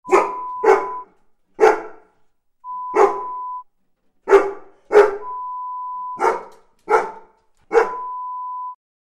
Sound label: Dog Barking sound